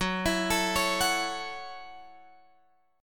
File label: Gb Minor 7th, Guitar Open F; Gbm7; arpeggiated